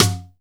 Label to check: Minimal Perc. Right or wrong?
Right